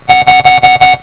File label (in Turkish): echolink bip sesleri, beep; TB2AJ